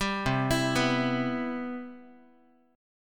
C Major 7th Guitar; CM7; arpeggiated; 10,8,7,9,x,x; 4A,4E,5A,5D,5G#,6C#

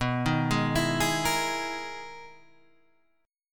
E Major Sharp 11th B

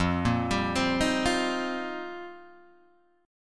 F 7th Suspended 2nd Suspended 4th, Guitar Open G

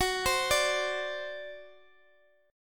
C Suspended 2nd Flat 5th, G flat, guitar chord

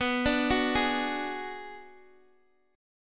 B Minor 6th B, Guitar DADGAD

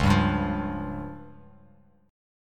C sharp Major Flat 5th, Bass Standard; C#Mb5 strummed; JGuitar

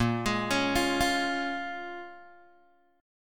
A sharp Minor 7th, A sharp, down, Guitar, Open G

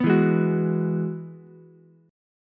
E Major Add 9th E down Ukulele C6; strummed

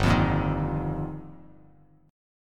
G Minor 7th Sharp 5th, Bass Standard; Gm7#5; strummed; JGuitar

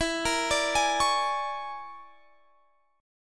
A sharp 7th Sharp 9th Flat 5th E

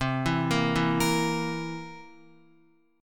C 7th C down Guitar 4F, 4A, 5C, 5G, 5C, 6E; arpeggiated; JGuitar

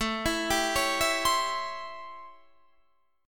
A 7th Sharp 9th

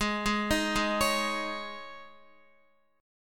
G sharp Suspended 4th Guitar chord, down; arpeggiated; 4F,4A,5C,5G,5C,6E

